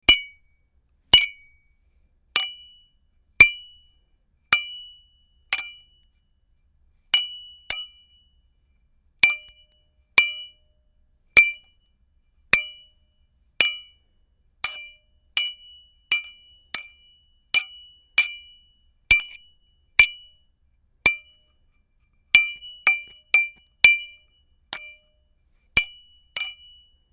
metal hit

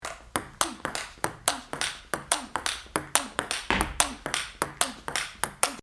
pingpong

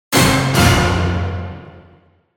jingle ochi

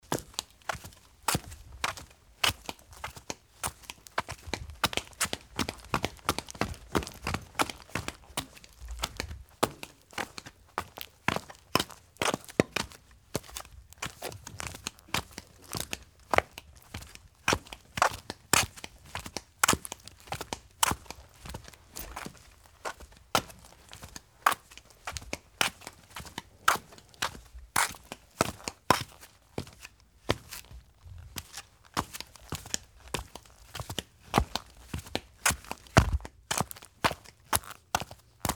footstep Geta

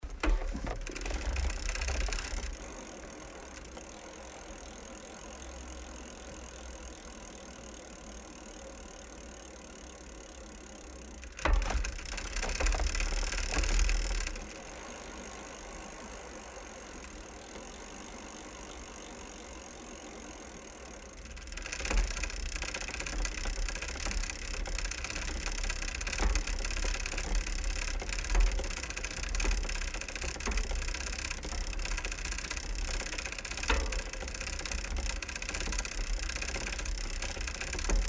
bicycle pedal slow

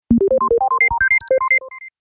digital

